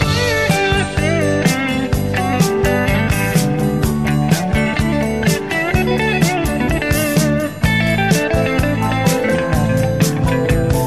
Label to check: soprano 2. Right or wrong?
Wrong